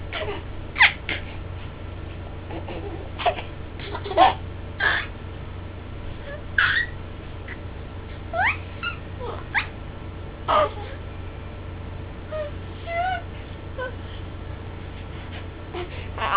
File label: laughs